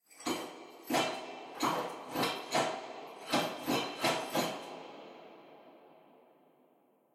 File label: walk walk surprised phase